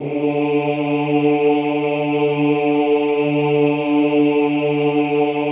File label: OOH D2, Classical Choir; Best Service; Extended Classical Choir; OOH MIX